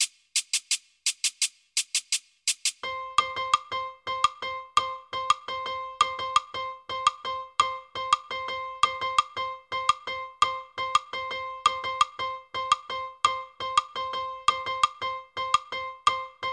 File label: 2-3 clave 2-3 tumbao fast